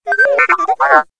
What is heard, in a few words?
r2d2 talk